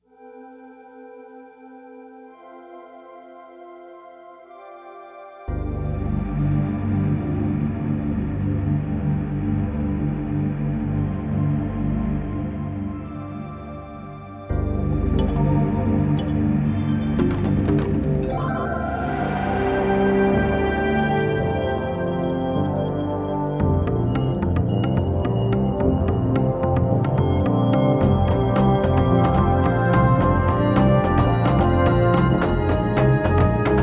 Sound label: World Beats